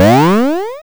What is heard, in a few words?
powerup; ui game